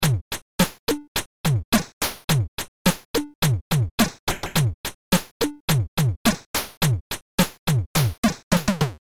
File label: beat